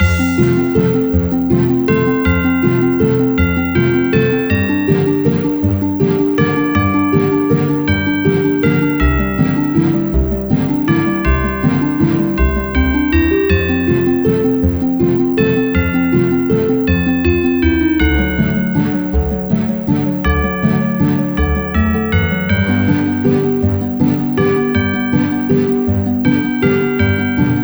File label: Stage BGM; hichi and friends